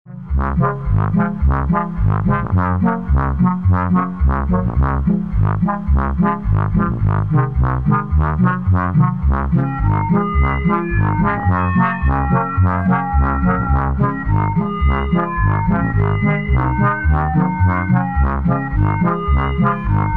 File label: polka